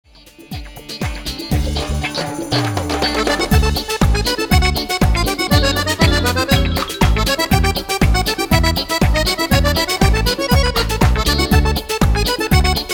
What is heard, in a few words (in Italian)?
disco fisa